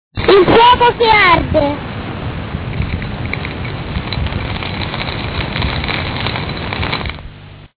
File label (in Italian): fuoco, rumori; inverno